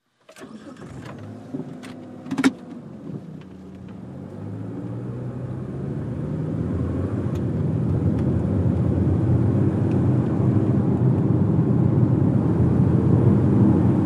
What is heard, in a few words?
Sedan Accel 60mph St